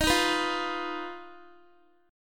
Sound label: Eb Suspended 2nd Sharp 5th, Guitar; Ebsus2#5; strummed